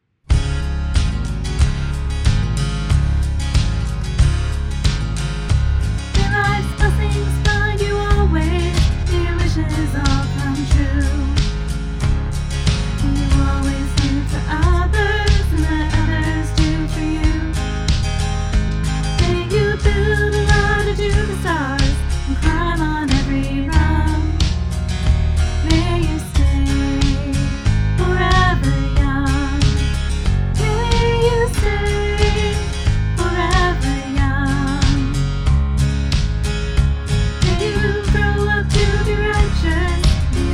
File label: vocal